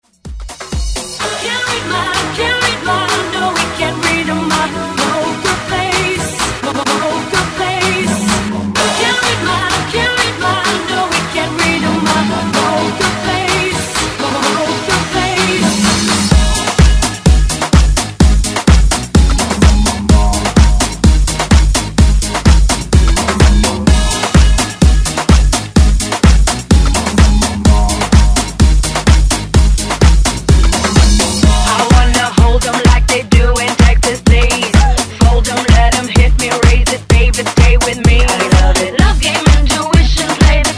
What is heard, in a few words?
Afro Epic Intro Redrum